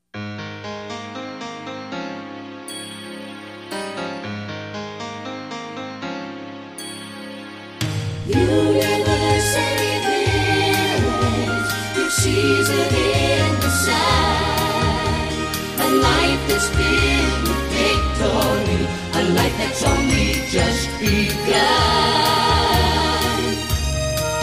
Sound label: with vocals